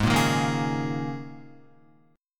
Ab Suspended 2nd Suspended 4th Ab down Guitar; strummed; JGuitar

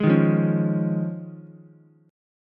Eb 7th Suspended 2nd Suspended 4th Ukulele, chord; Eb7sus2sus4; strummed; 1,3,1,4; JGuitar

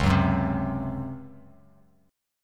Db Major 7th Suspended 4th Db down Bass Standard; strummed; JGuitar

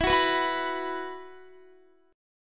E Suspended 2nd E, Guitar, 4E,4A,5D,5G,5C,6F; Esus2; strummed; x,19,16,16,18,18

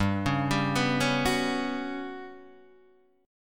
G Major 7th Flat 5th, Guitar, Open D; 5,4,5,5,4,4; down

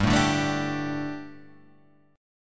G Suspended 2nd, Gsus2 chord; strummed; JGuitar

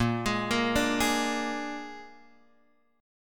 A sharp 7th A sharp, Guitar, Open G

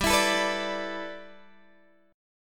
G 6th Add 9th, Guitar, Open G

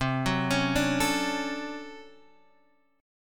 D Major 7th, C; Dmaj7/C arpeggiated

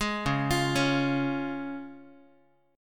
C sharp Major, Guitar; 11,9,8,11,x,x; arpeggiated; JGuitar

